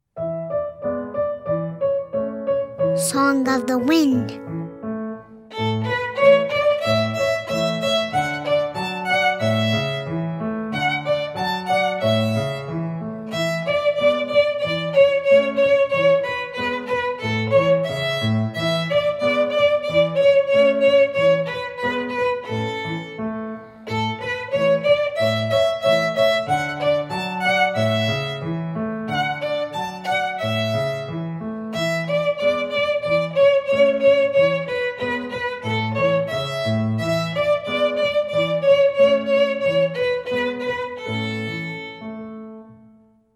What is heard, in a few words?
Folk Song, Violin; Song of the Wind; Suzuki Violin Method - Vol. 01